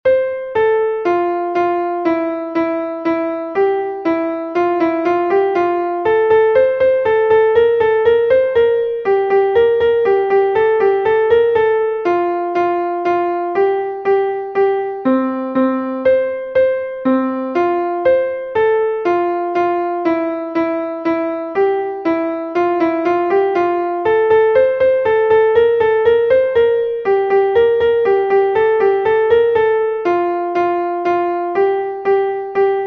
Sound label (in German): klavier; caffee kanon; melodiemeister; Spottlied „C-a-f-f-e-e (Kanon)“